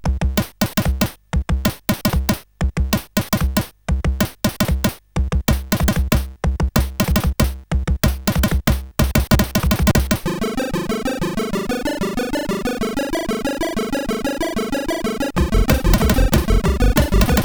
Happy Hardcore, CSG8580R5

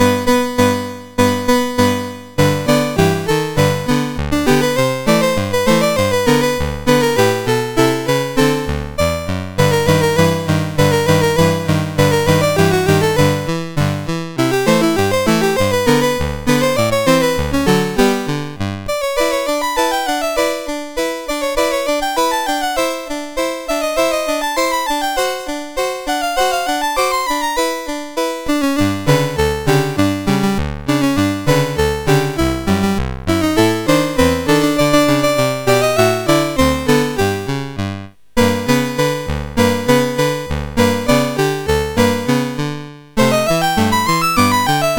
Jingle Bells Jubilee, MOS6581; Christmas

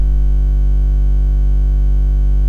Project Firestart, MOS6581R4

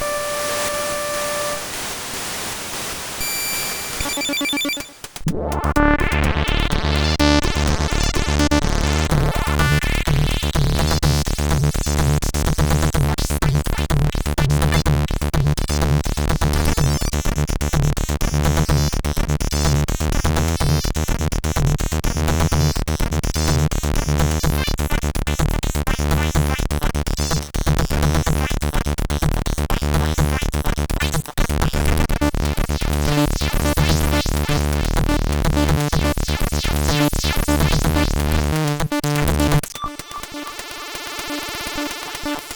Beat, sid CSG8580R5; HAL Beat

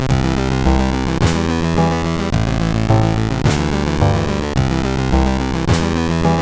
Low Speed, sid CSG8580R5

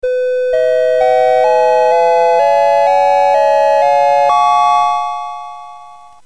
BASIC sid CSG8580R5, GAMES; Stack 64 Simon

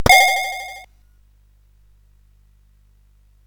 SFX; Narco Police

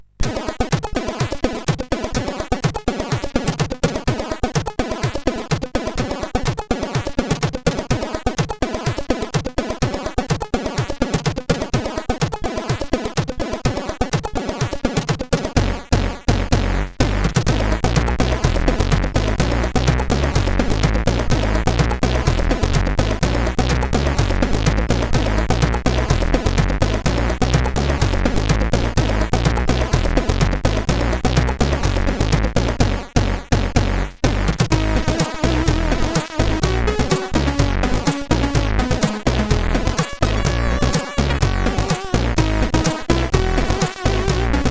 Music, sid CSG8580R5

